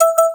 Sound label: prompt single low; IQ.Pilot; selfdrive